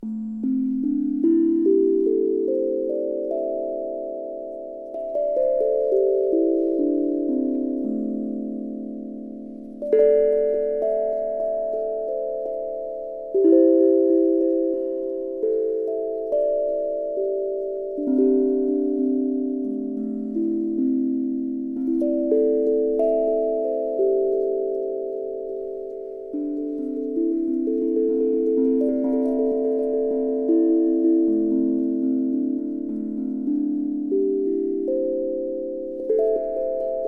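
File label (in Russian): Ля минор пент A3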